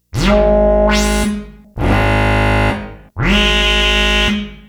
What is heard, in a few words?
Octave Kitten